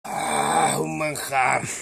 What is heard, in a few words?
ahhh